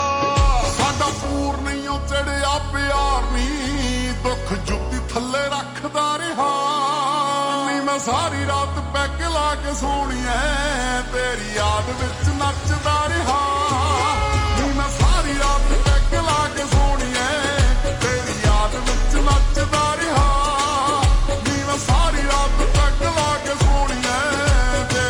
Ringtone Slowed Reverb

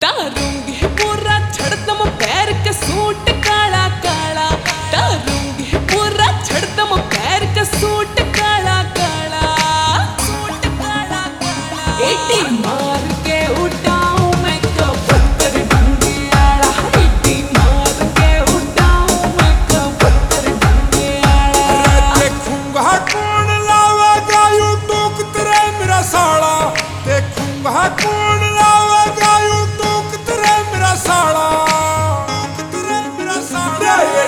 Ringtone Slowed Reverb

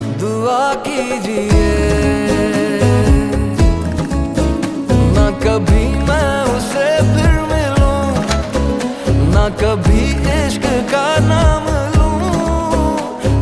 Ringtone

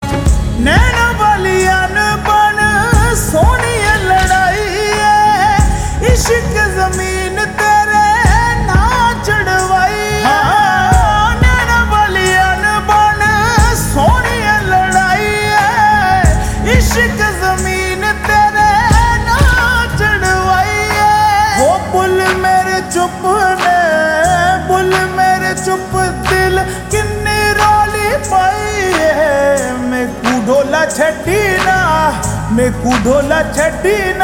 Ringtone Slowed Reverb

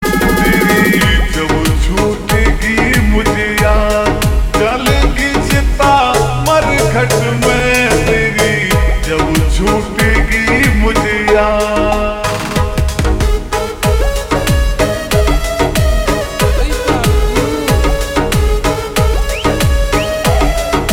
Ringtone Slowed Reverb

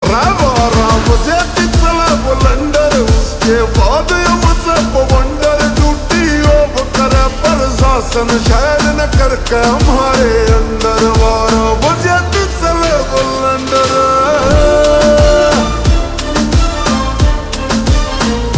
Ringtone Slowed Reverb

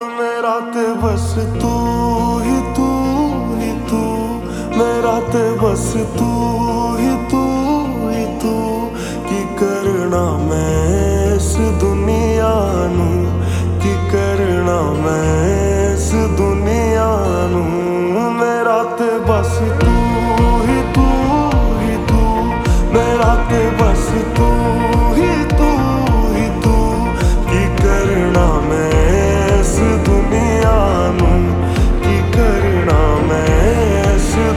Ringtone Slowed Reverb

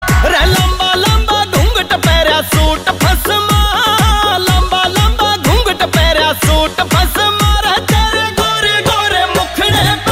Ringtone

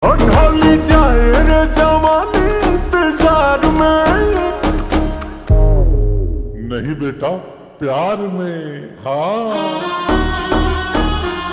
Ringtone Slowed Reverb

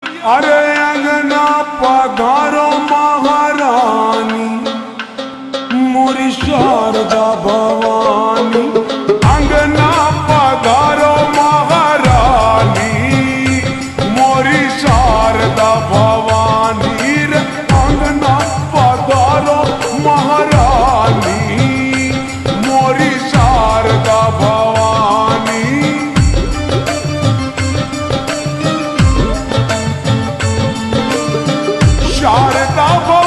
Ringtone Slowed Reverb

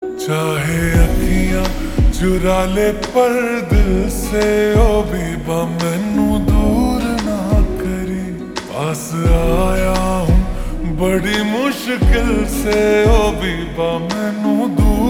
Ringtone Slowed Reverb

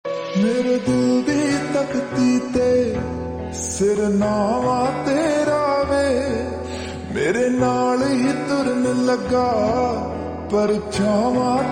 Slowed Reverb Ringtone

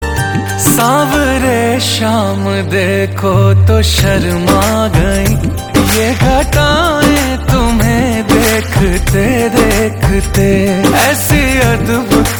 Ringtone

